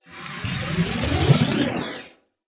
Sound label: fwamf long; CinemaSpice; Mograph Mini Transitions